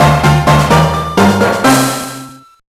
Fanfare Level Up

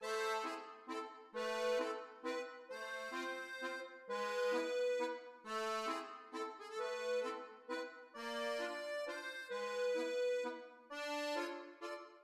Accordion

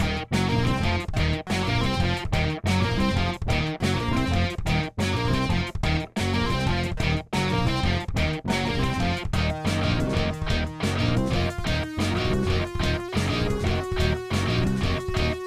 Factory Boss Loop; Factory Boss Ph1 Loop

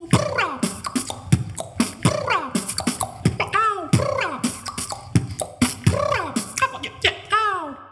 beatbox melody 16 beats

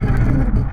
Platform Lowering

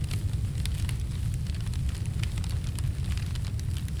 Fire Loop

